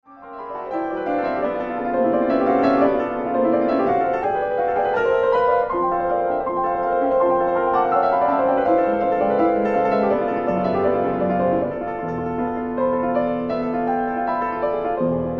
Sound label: Preludes for piano, Allegro Vivo